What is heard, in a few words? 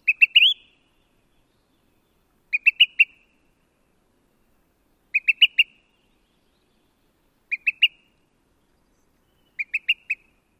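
pit-pit-pit calls, olive-sided flycatcher; AK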